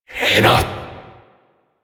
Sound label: Possessed Vocal Attack; Enemies; Warframe; Priest Quest; Possessed Red Veil